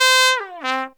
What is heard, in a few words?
TRUMPET FX, Phantom Horns; Zero-G